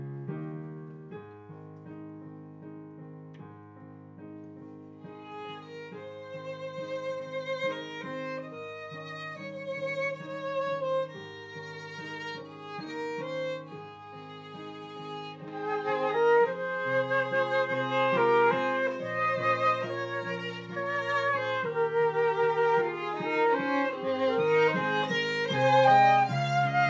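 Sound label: Viola C minor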